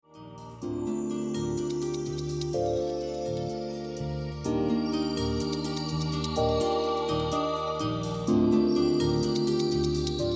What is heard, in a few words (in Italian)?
Spa Music Relax